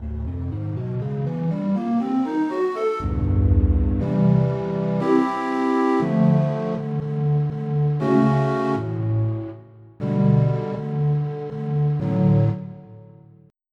Shakuhachi

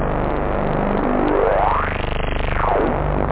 lfo, effects

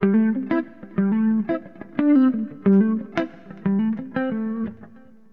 FUNKY, HipHop Guitar; LiveAct Pool 1

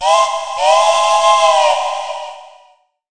Whistle Start

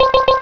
Bonus; Computer Kids; Reading

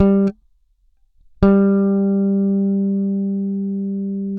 Bass Oct G, Standard Bass, Fingered; Future Music 10th Birthday 2002

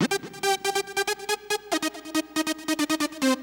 B1, Melody; Techno; TechnoMusicMaker

